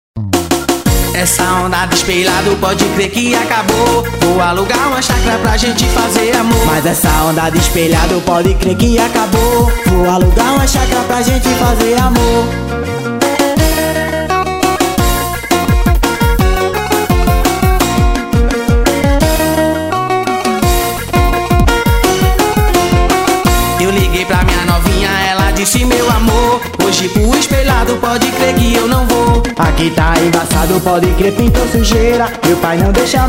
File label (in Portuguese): brega